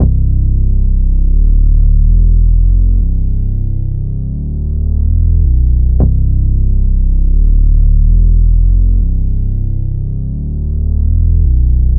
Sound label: Moving, ambient